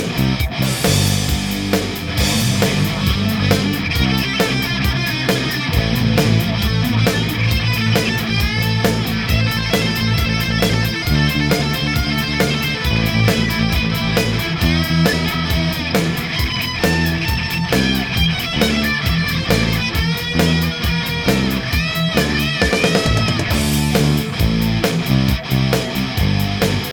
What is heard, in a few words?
Sunrise Solo